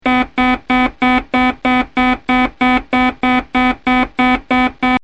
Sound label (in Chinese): 警报声; 告警; 广播室铃声系统